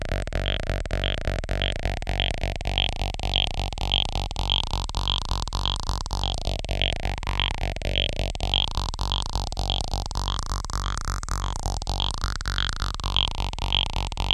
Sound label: EMS SEQ; TECHNO-BOX